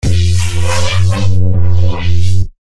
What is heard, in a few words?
Neuro Bass FX; BHK Samples